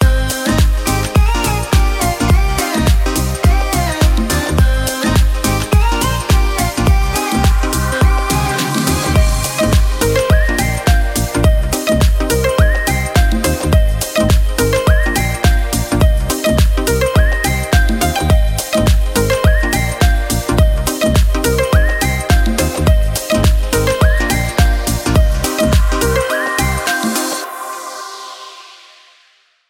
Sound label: Tropical Beat, ringtone; MIUI14 and HyperOS 1.0